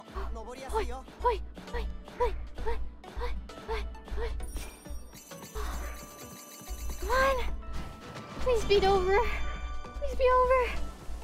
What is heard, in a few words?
noises